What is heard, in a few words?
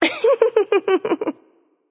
laugh; smite; voicepack; nike